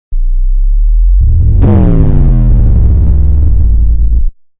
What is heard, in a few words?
Bass Drop